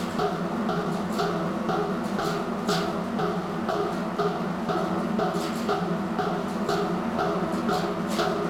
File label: Elevator Motor Loop; Warframe